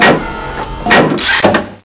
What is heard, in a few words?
turret up, sentry gun; cstrike; CSSB